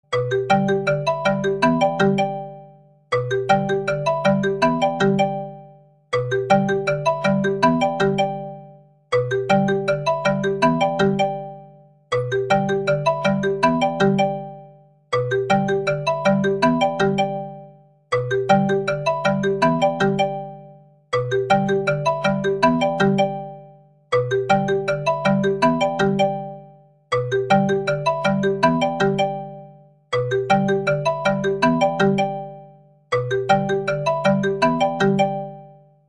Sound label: Apple Marimba; suonerie